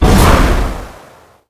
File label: elderdragon shoot; dragon knight